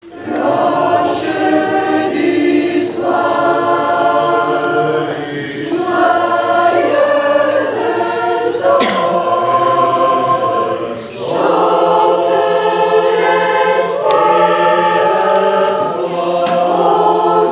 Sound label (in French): Cloche du Soir